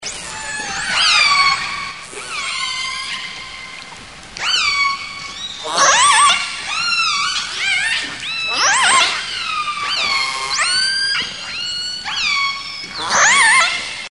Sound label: northern residents alaska killer whales